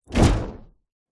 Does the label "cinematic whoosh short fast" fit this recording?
Yes